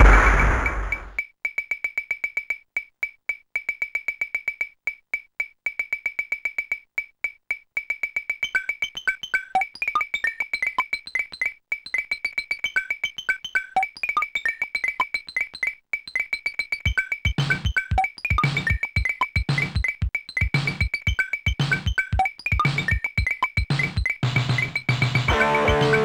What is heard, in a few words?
morse; Sonix Music Driver